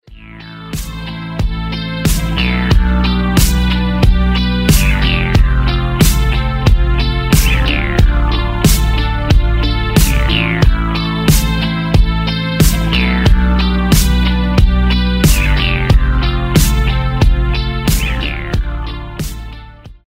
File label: ringtone, galaxy ringtones; cruise